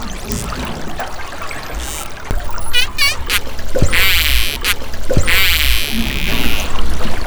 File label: clicks rissos dolphin